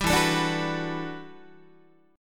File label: E Minor 11th, Guitar; Em11 strummed; JGuitar